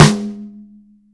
RIM, ROCKKIT

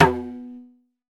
Madal Ta, Roland BK-5 Percussion

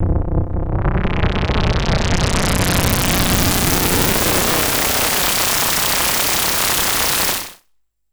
Pulse, Filtered Noise; noise hiss crackle

